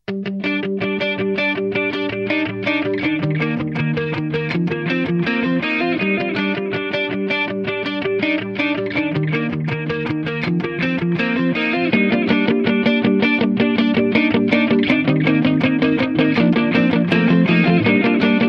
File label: samsung galaxy s4 guitar